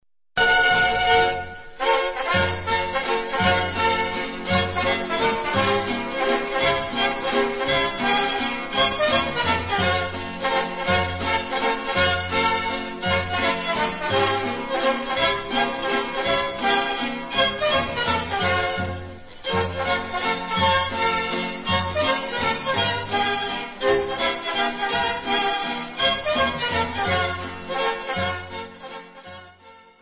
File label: Mexican Medly, Instrumental; Muzaiko